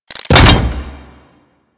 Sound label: Pet Grab, Foley